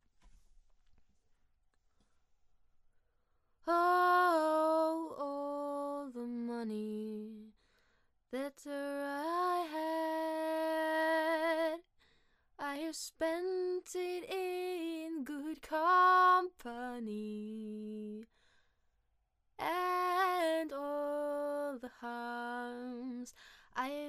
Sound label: parting glass